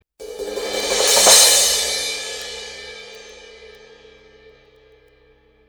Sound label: 17 studio crash; sabian